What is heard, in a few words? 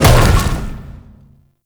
bowl ball impact; Warframe; Grendel